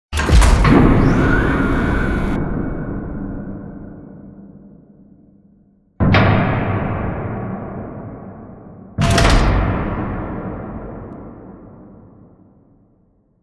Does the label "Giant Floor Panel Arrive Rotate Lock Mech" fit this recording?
Yes